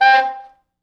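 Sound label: tenor sax noise fx; East West; Quantum Leap Horns Sax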